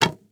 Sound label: Bow Release, Game Weapons; Military; Game Essentials